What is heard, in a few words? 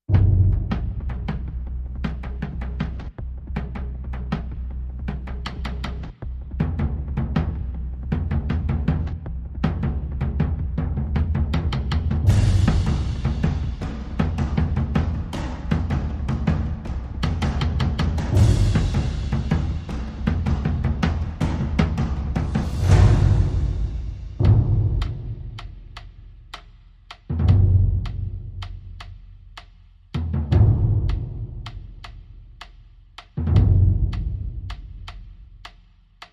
Percussion Only